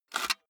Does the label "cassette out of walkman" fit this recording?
Yes